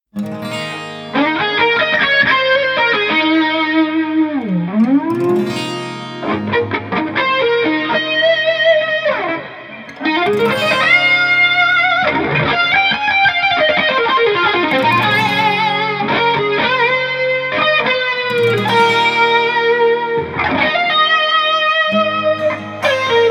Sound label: Solo (Instrumental)